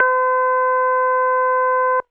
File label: Korg DS 8 Rotary Organ C6